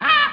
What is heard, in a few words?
vulture wound